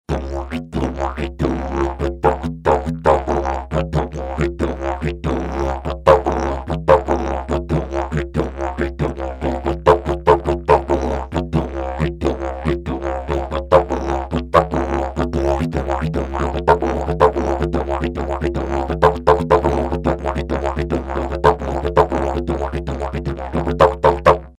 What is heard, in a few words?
didg; tau-ki-tau-ki-tau raka taka taka taka raka